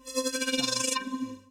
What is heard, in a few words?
inlane rollover; BALLY; sfx; TWILIGHT ZONE; Pinsound; Twilight-Zone-Full-Reorchestration